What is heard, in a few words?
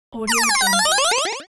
8-bit game over